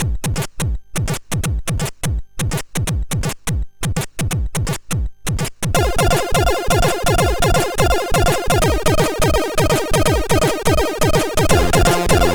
SID Jig, MOS6581R4

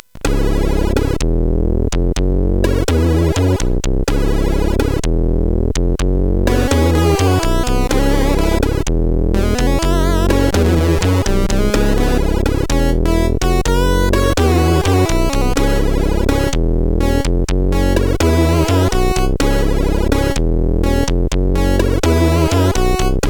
Blues, CSG8580R5

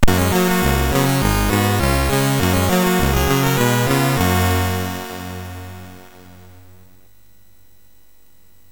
Super Cup Football, MOS6581R3; hvsc

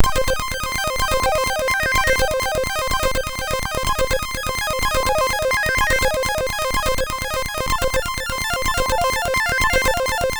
Tracker Player intro, sid MOS6581R2

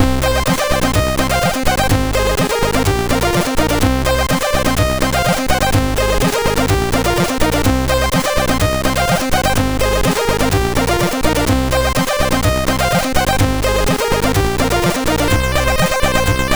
Rave Beat, MOS6581R4

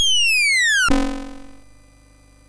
sid MOS6581R3

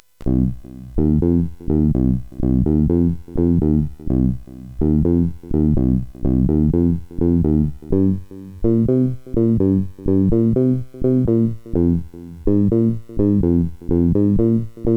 Bluesbassline, CSG8580R5

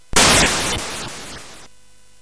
Frantic, CSG8580R5

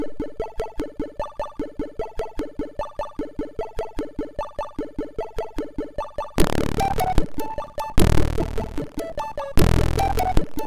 sid, MOS6581R2; Sys-e-pus

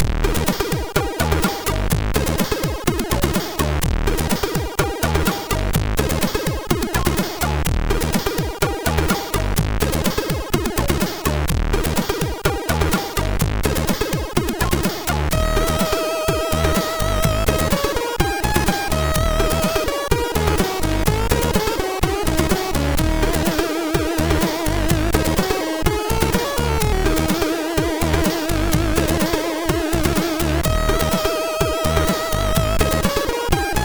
Bass, MOS6581R3; Spass; hvsc

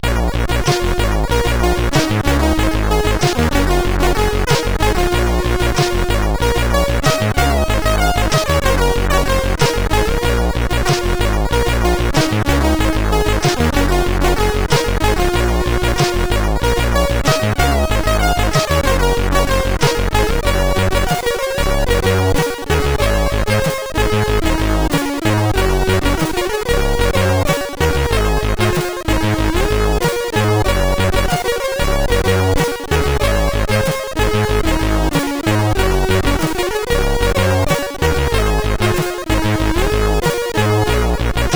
Game Muzak, CSG8580R5; Moon

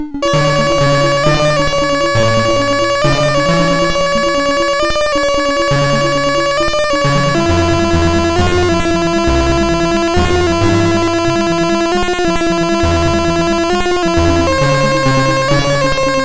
Randomly generated music BASIC, sid MOS6581R2

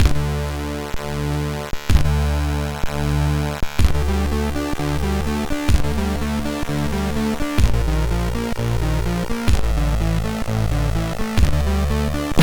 sid, MOS6581R2; Silents Info 1

